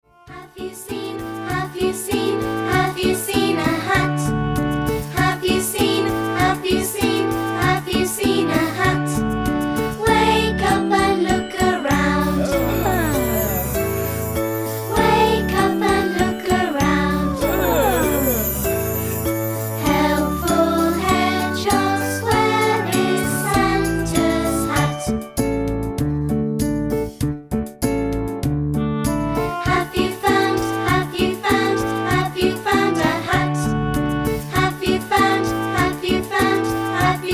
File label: Helpful Hedgehogs